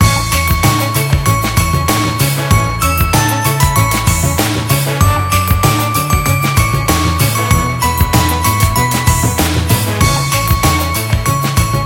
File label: Cool Christmas Loop; SafeMusicList